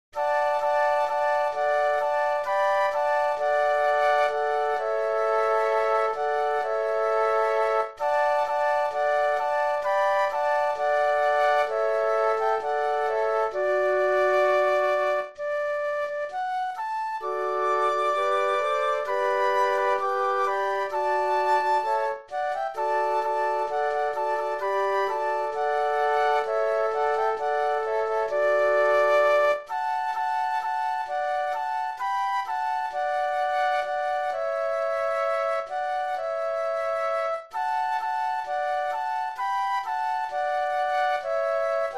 Camptown Races Flute Quartet

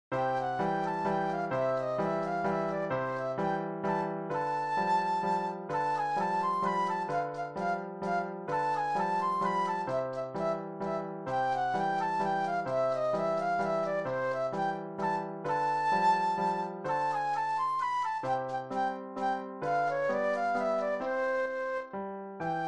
waltz flute solo; classical; Strauss; Die Fledermaus

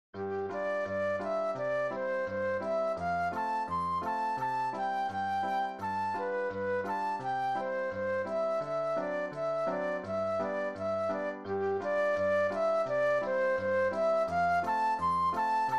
Can Can Flute Solo; classical; Offenbach

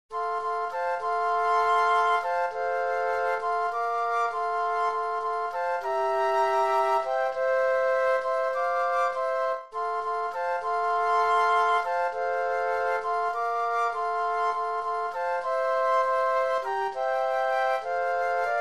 Hes Got the Whole World in His Hands Flute Trio

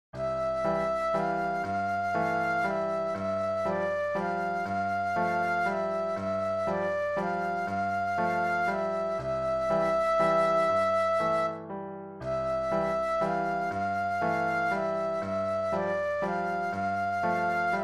flute solo; classical; Offenbach; Barcarolle

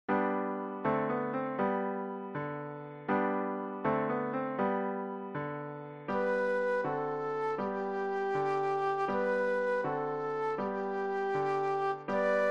flute trio and piano; Three Blind Mice; folk trios

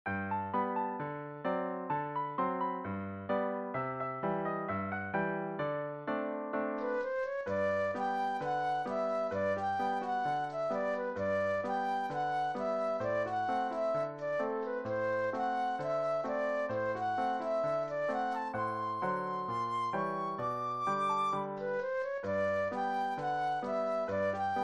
Rag Theme Flute Solo; classical; Joplin; Peacherine

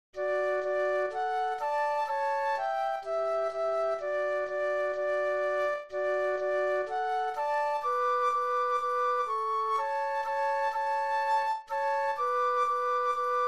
Sweet Betsy from Pike Flute Duet

